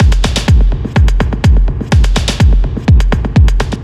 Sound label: 125, Drum Loops; Scuttlefish; M8; Electronisounds